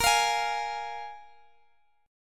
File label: F Minor Double Flat 5th A sharp